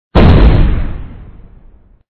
explosion; CrazyDriver; Action Games

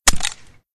wood break; MemoryChallenge; Puzzle Games

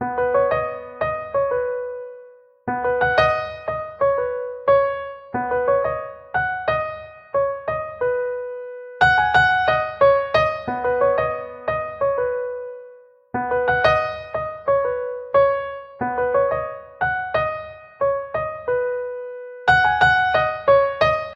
grand piano; DD21Blitz

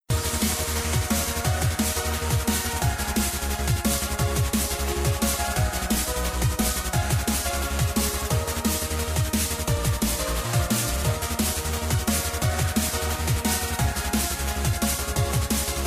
shop music; BoatRush; Racing Games

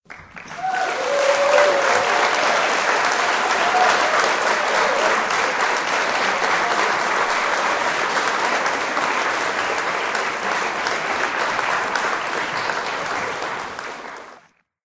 clapping; MemoryChallenge; Puzzle Games

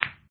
ball collision, Snooker

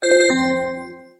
game over; HighOrLow

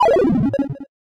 hit pole, basketball; Sports Games; BasketballPractice

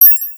signal

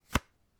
card slide; BlackJack

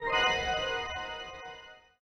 lose; BlackJack

